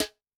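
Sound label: Snare Ambience, Snare; Yamaha DTXpress-II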